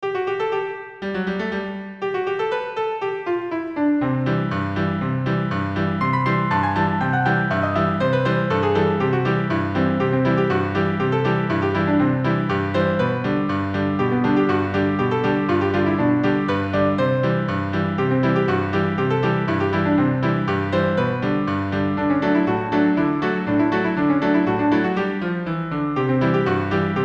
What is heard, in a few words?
Happy Sailor Polka piano version